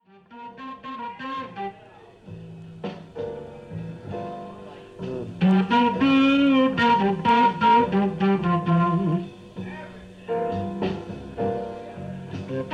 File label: blues